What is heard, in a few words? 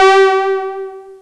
POLY6 C4, Korg Poly-6